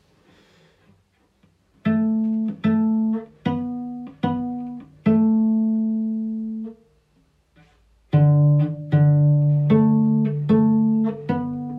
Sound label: pizzicato, cello; pizzicato - gezupft